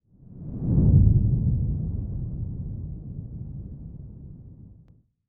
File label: Swoosh